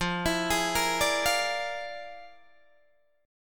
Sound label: F Minor Major 13th, Guitar, DADGAD